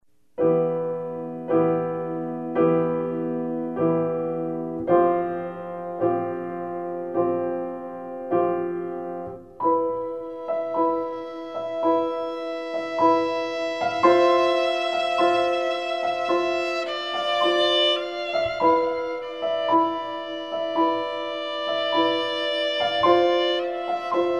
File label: cool down; modern class